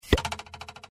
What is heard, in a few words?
twang; disney; mashup